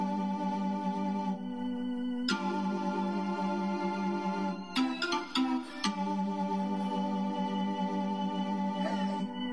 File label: organ only